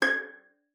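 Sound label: violin pizzicato G#6; Xsample VOL-5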